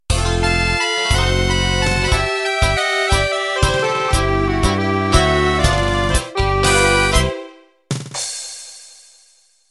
Tango Italiano Intro

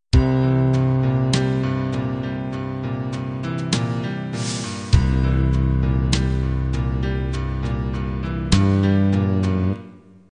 country-pop ballad intro

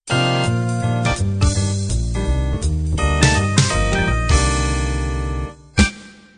b3 jazz end

